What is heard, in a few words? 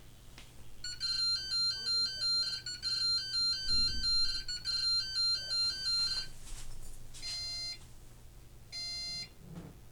bios wrong beep; BIOS nenaběhne; zvukového signálu; HP; PC; BIOS nenaběhne, pomoc s identifikací zvukového signálu